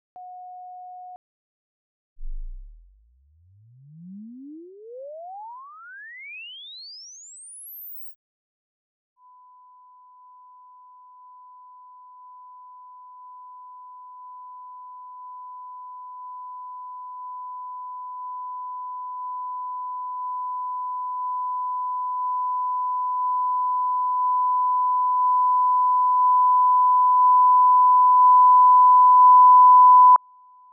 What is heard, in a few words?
Alesis